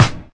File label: low tone snare sound a sharp key; snare drum samples